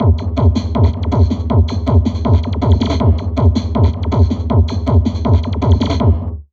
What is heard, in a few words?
Drum Loops Crazy Processed